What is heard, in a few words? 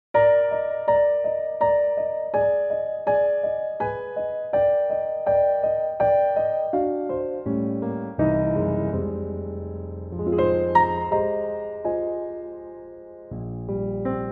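Eb piano track; Eb Pianotrak; key - Eb - vocal range - Bb to D; Wonderful 2016 Pianotrak arrangement, in waltz time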